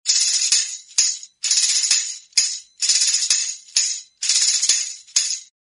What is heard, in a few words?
tambourine rhythm